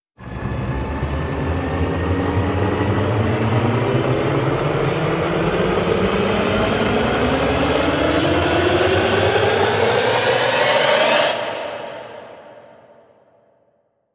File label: Rise Turbine